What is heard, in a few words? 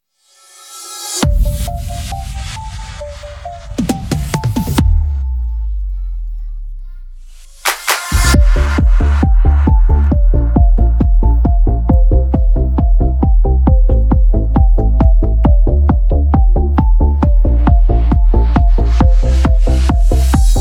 instrumental